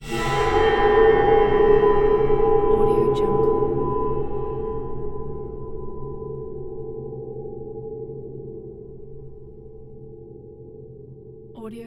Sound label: windy screech whooshes; lokohighman